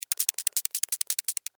Insect Walk Move, Animal, Insect, Walk, Movement, Bug; Insect Movement Sound Effects; Blast Wave